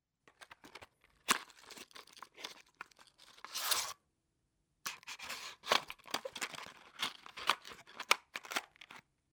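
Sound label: box opening sound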